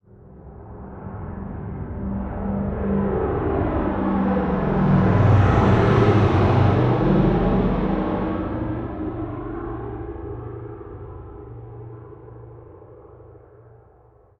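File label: Ambient Vehicles; Red Orchestra Ostfront 41-45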